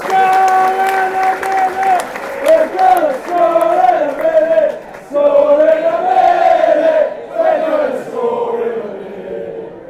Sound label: score minute; oxford